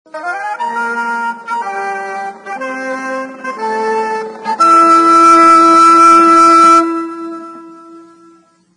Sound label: fanfare; clarence